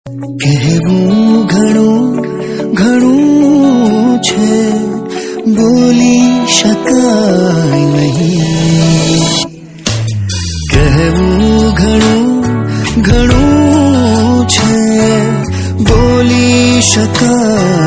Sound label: gujarati ringtone